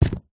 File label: container with dry rice inside set down on wooden counter; zapsplat; foley